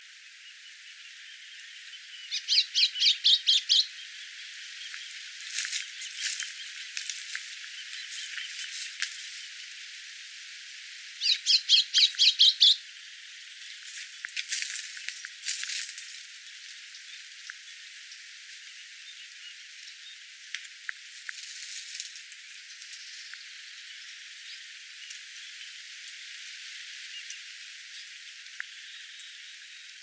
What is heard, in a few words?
sporophila albogularis